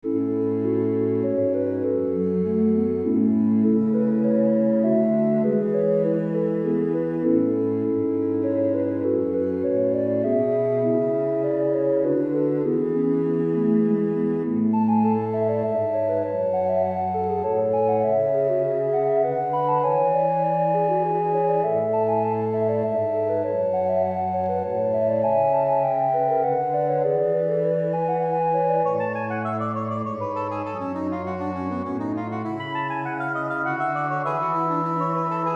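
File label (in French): livre d'orgue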